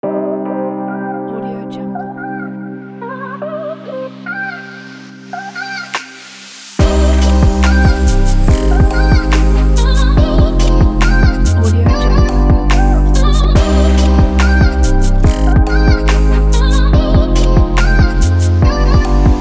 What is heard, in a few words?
inspiring fashion vocal beat